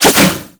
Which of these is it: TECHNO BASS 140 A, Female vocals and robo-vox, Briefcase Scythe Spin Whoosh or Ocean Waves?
Briefcase Scythe Spin Whoosh